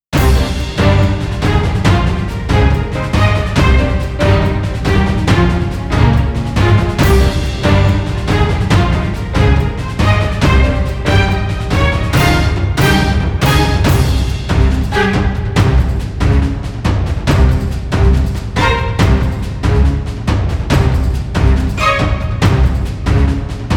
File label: Fight; shohei tsuchiya works